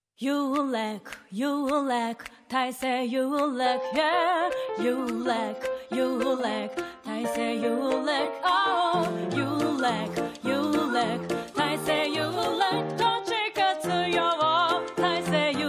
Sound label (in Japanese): CM song vocal, ギターとベースによる演奏です; u-lec